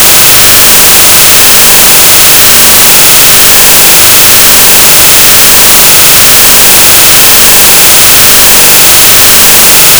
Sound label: white